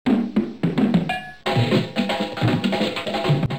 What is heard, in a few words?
drums